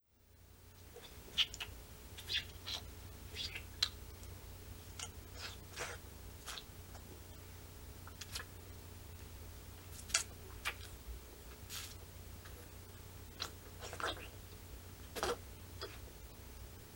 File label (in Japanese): JKのリアルフェラ音